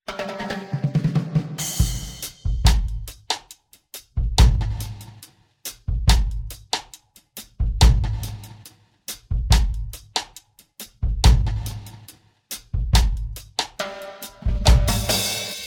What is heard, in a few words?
music home drum